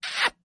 Quick Kiss

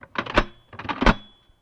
Metal Latch Dbl Clun, latches & bolts

